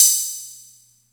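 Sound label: open hi-hat, Atlantex Music Percussion Computer drums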